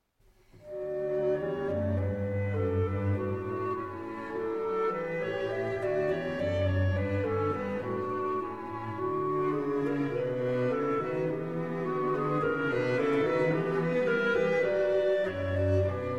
Clarinet Quintet in B Minor; Brahms

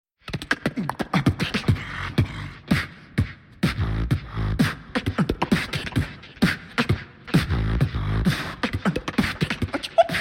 Etude (Drum & Piano Version)

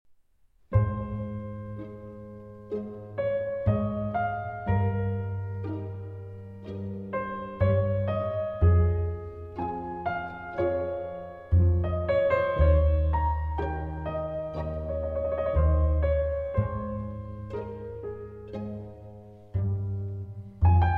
Keyboard Concerto No. 5 in F Minor, Largo; II. Largo

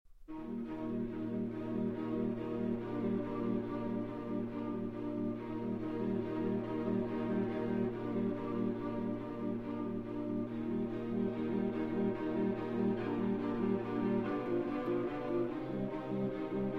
String Quartet

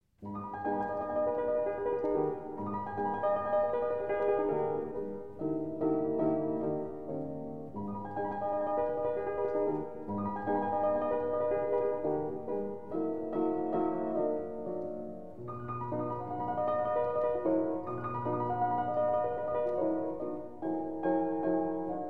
Impromptus, Allegretto in A-Flat Major

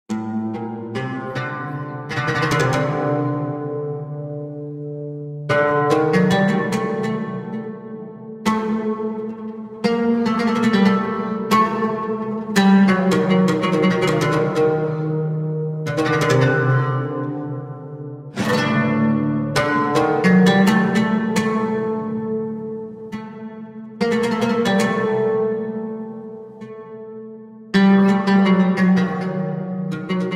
Middle Eastern Oud Meditation

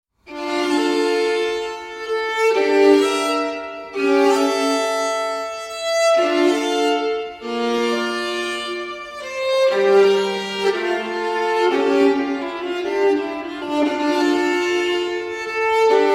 violin solo; J.S. Bach Partita for Violin Solo No. 2 in D Minor, BWV 1004; 5. Chaconne – Pt. 2

